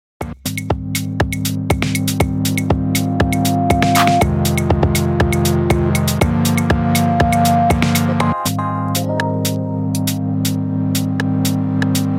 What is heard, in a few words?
electro pulse